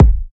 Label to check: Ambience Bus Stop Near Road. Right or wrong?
Wrong